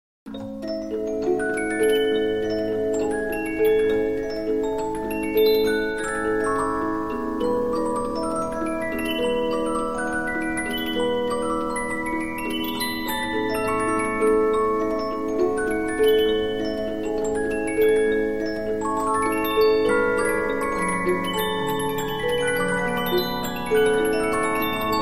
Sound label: Polyphon, 15inch disc musicbox